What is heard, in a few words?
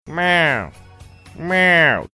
meowing